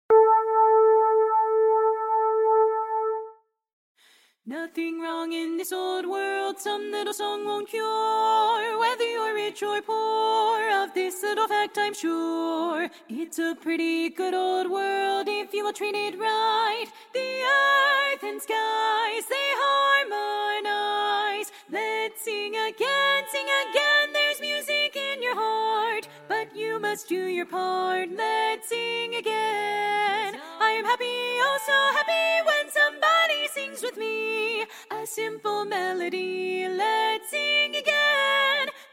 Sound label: tenor, chorus